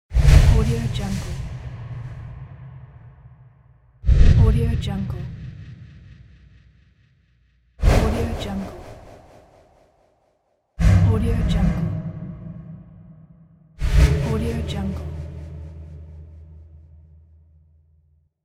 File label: deep cinematic air whoosh; b-n-p